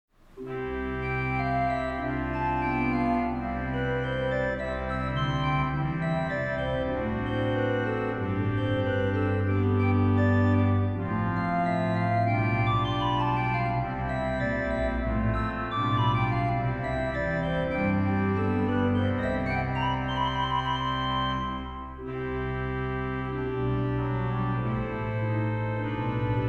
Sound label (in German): Pastorale fuer Orgel; IMPULSE